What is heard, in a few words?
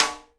snare JUST RIM, Live Snares; E-MU Producer Series Vol. 8 – Platinum Phatt (CD 1)